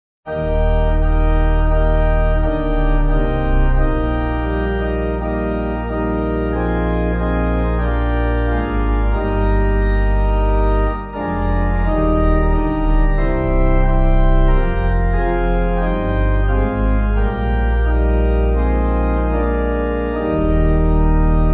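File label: Pipe, Pipe Organ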